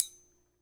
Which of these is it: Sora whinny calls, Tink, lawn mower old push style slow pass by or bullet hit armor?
Tink